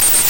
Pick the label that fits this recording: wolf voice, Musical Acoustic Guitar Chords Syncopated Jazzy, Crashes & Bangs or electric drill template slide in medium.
wolf voice